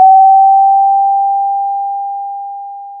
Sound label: pop, Sound of a doorbell